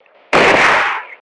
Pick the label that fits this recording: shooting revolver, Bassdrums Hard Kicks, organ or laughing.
shooting revolver